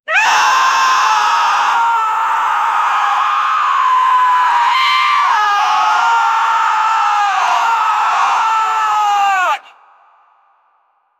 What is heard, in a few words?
tarzan screams; vocalizations